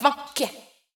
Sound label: VOICE GRL HOUSE 125 A, House Voice